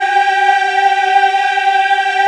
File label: G4, Choir Acoustic & Synth; USB Soundscan vol.28; AKAI